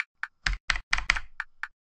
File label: 10 14 10 metronome; Acid